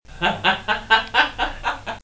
Laugh Man

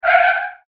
CAR SKID; Mono

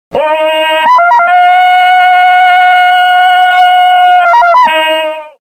tarzan

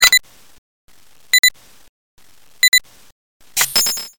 4 beep

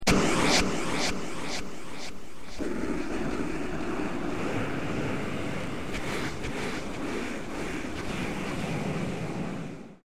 WIERD

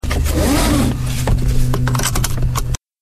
W123 Diesel Sounds seatbelt install

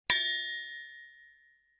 glass clink

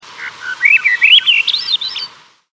swainsons thrush

